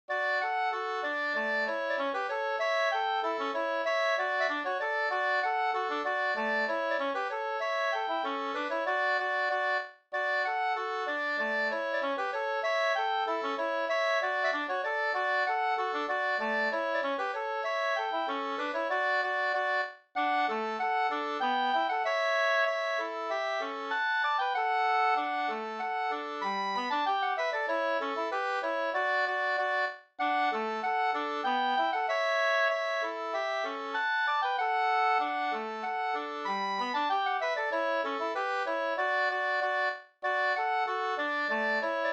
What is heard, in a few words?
polka; e Georg Palmqvist Malmkoping 2st